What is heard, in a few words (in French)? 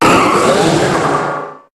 Cri Méga; HOME